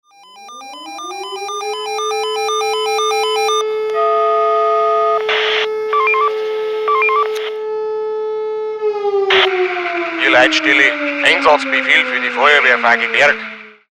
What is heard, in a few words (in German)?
Alarmierung